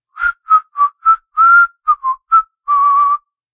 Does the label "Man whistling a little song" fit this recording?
Yes